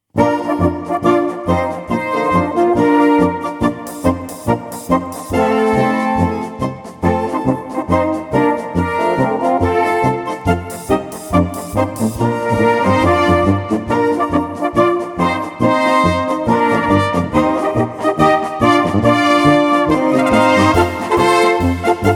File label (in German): Blasmusik